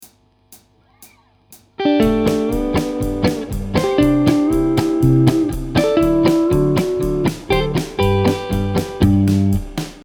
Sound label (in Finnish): pedal steel venytykset